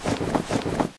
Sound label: cloth shake